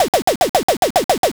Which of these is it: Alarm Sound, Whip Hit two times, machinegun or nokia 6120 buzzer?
machinegun